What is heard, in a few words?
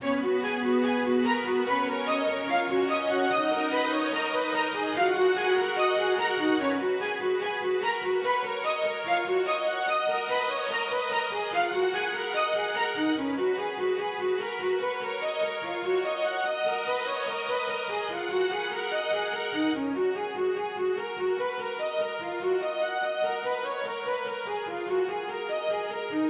Orchestral Strings Pulsing Energy A#, Cinematic Trailer Loops